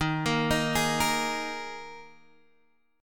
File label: D sharp Suspended 4th D sharp, Guitar Half Step Down; chord